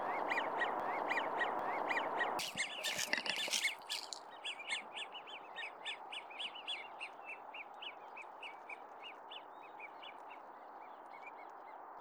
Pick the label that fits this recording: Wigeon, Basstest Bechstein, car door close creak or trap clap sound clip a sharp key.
Wigeon